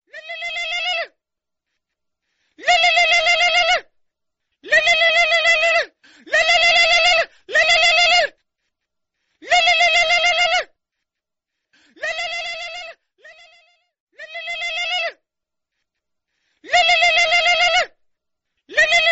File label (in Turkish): Lu Lu Lu Zil sesi Melodisi